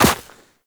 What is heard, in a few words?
Run Snow, Footsteps; Warframe; Characters; Operator